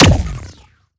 Shot Small Blaster Energy Strike

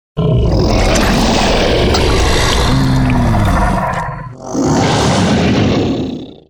Char Werewolf Idle Combat

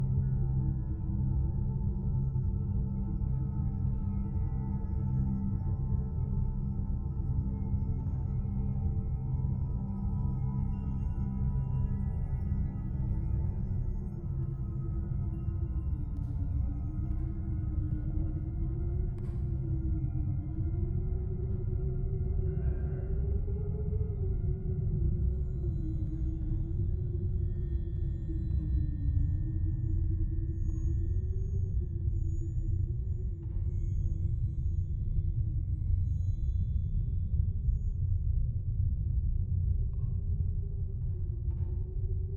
table top atmo less Spoopy; popup dungeon

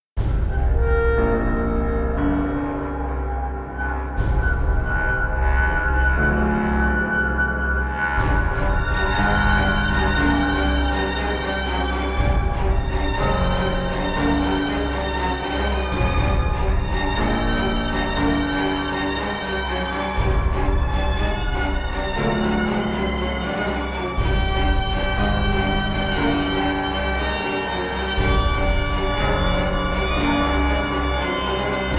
Haunted Forest

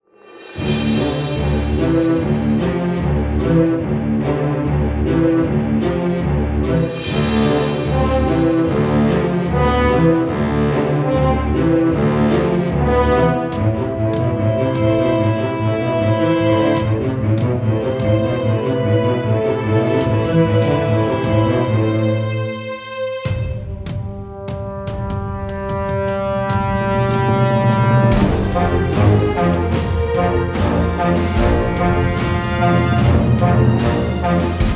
Tension; Militant; Level 07 Part 02

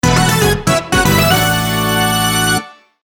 Race Start Fanfare; mario kart tour original soundtrack

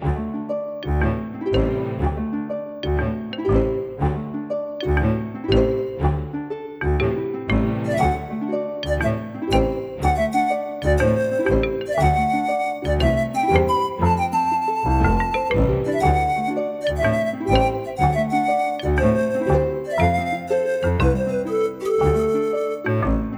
Medieval Forest Theme; club penguin

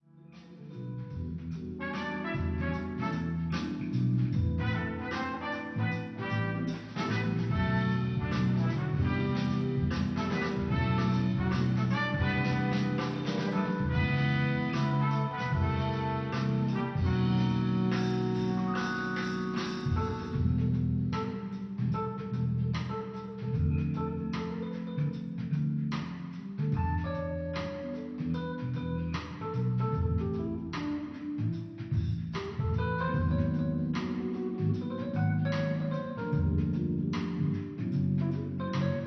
Jazz; Usen; Fist of the North Star Lost Paradise; Hokuto ga Gotoku; PlayStation 4 gamerip

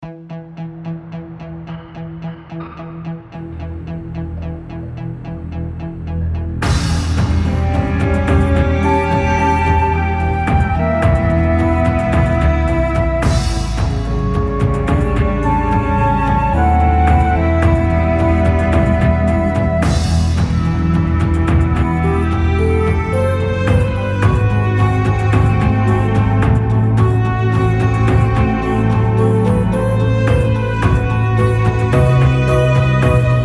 Frost Caverns Music; Dungeon Souls

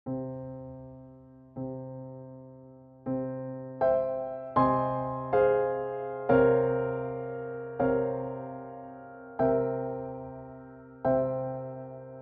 Theme Piano; Get Even

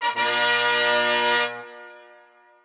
Victory; Avocuddle

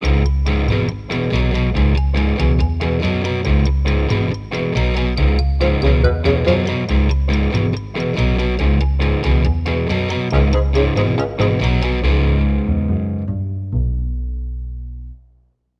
Bari Sax

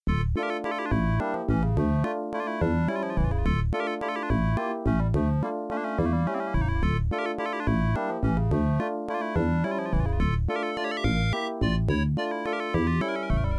Spookville, Adlib; Lollypop; MS-DOS

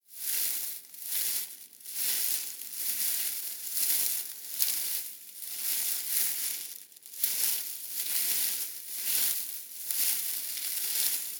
grass leaves debris movement long

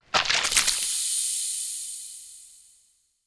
SFX Acid Spill

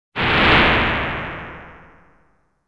Fire sound; Kiragon (Boss 2)

